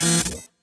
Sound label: Force Game Over